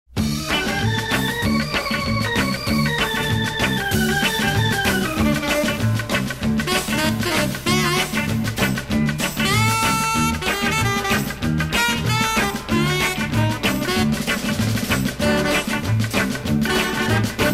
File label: orchestra hava nagila